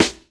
SD, Dance Drums

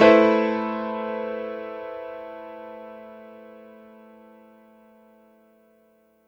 Piano stack (A) min; Sonic Foundry (Sony Creative Software); Ugly Remnants VOL-2

